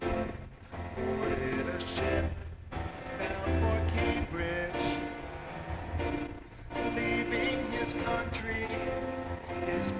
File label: no drums inst src; SiSEC08; SiSEC underdetermined